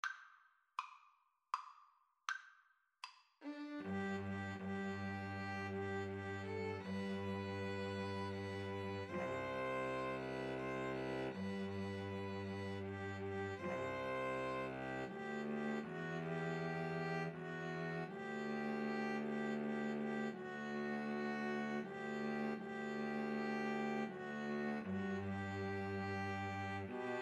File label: Shenandoah, 2 Violins Cello